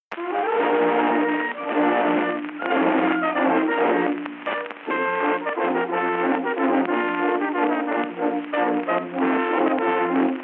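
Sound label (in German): Stadtkapelle Trompeten Walzer; Stadkapelle Finsterer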